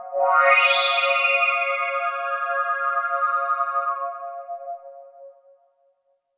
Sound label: Old Skool Falls, Underground UK House & Electro, Pads; Sonic Foundry (Sony Creative Software)